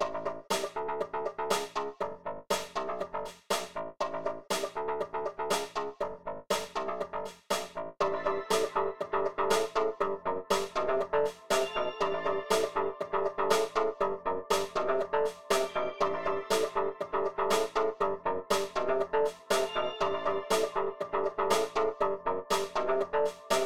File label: Fight Music; Game rip; MiSide